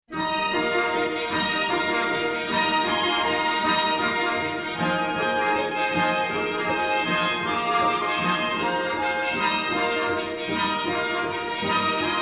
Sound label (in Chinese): 电影音乐; 奥斯卡电影音乐